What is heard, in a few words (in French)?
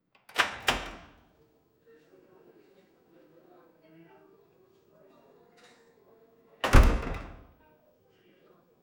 porte d'un magasin qui se, closing